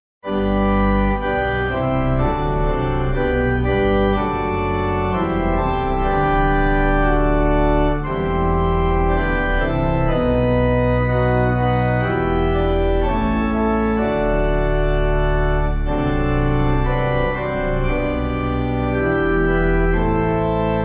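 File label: Pipe, Pipe Organ backing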